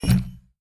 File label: snap turn go; SteamVR; InteractionSystem